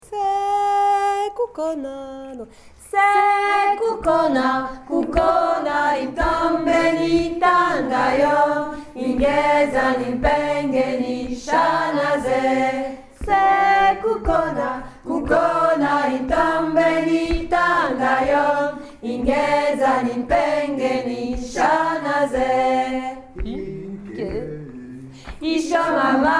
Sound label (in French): Kukhona 2eme voix